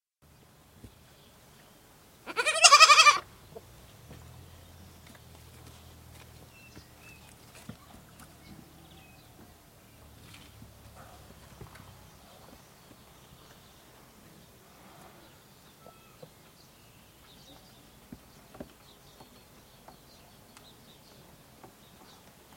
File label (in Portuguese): Covas do Monte Cabrito no curral e pombos